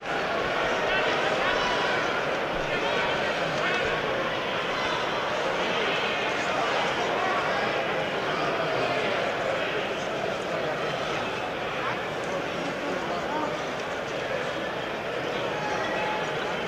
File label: Crowd Walla, boxing